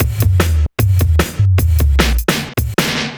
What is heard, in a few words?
Drum, Breakbeat